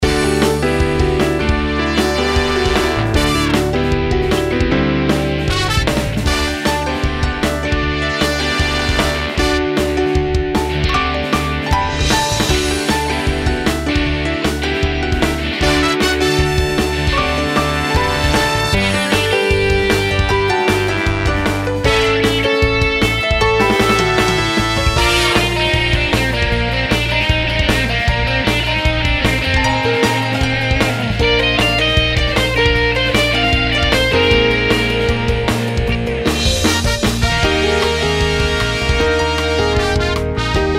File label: Upbeat Themesong